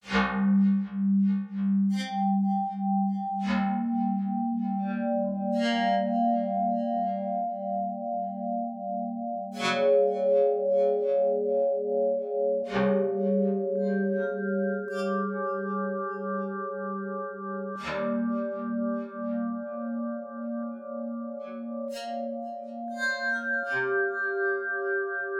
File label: Smooth Pads